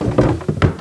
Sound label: DRAWER CLOSE